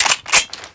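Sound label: weapon foley pp2000 reload chamber; Call of Duty Modern Warfare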